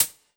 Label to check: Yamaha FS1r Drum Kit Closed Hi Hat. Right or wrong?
Right